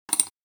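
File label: mouse click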